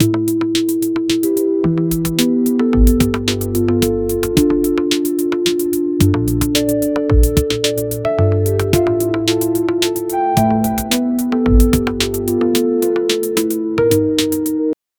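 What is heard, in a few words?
light unobtrusive background; chillout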